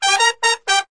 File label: four horn hit finale; Horns and Brass